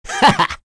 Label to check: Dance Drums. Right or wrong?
Wrong